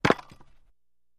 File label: Axe Falls To Ground, Dull; Axe Fall On Ground With Dull Thud And Light Bounce. - Light Metal Thuds